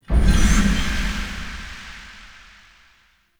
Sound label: UI Siege Start Stinger